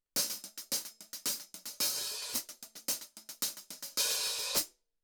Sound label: 14 HH CLOSE OPEN, cymbals